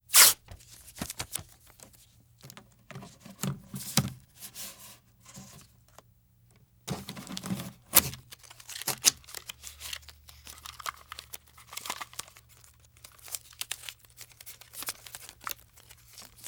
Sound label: Tape Handling Misc; Fairlight Sound Lib